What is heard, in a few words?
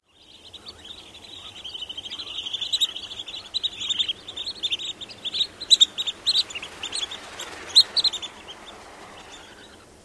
flyover of small flock